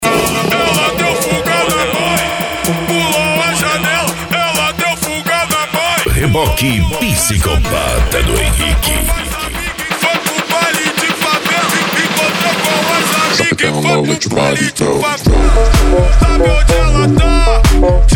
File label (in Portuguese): eletro funk